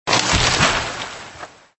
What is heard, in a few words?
Smash Tree; Extra Lives